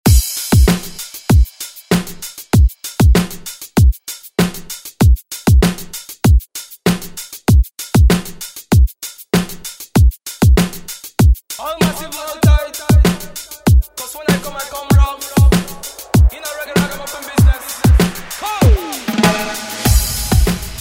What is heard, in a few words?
2025 Re Drum